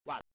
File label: error, sounds; fujitsu